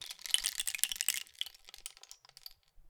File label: Rain Stick, Percussion Samples